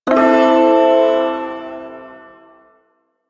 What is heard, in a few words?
Shawzin Pent Maj Chord A; Warframe; Duviri Ersatz Shawzin